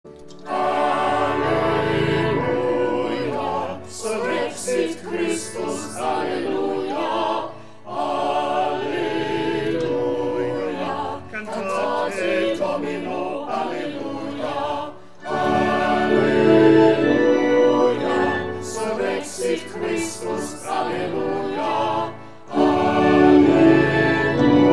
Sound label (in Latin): Surrexit Christus; Easter 2020